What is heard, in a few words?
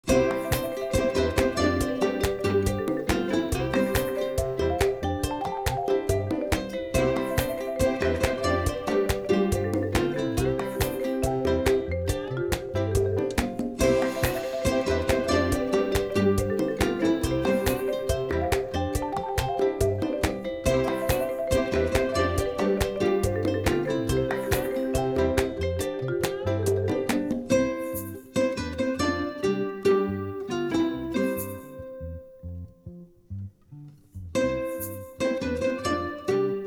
quirky underscore